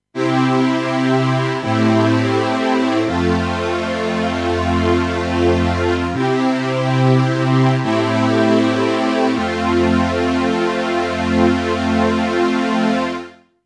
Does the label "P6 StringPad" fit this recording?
Yes